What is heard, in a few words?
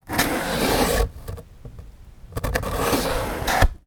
Cardboard Box Open Close